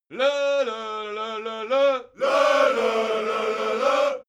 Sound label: crowd musical bawling